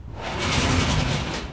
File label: door metal rusty move, doors